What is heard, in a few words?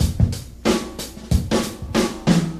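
93 bpm drum beat d key, kick tuned to the D note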